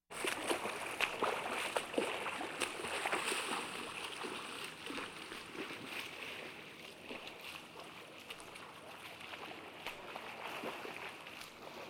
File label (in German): schwimmen im pool